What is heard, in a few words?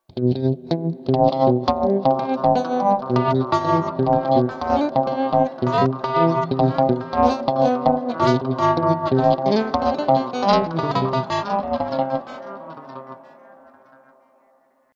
clean Ring Delay; Ampero